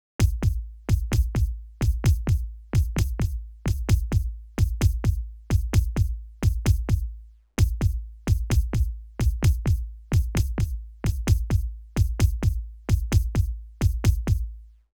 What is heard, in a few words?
Kick Layers Phase Slip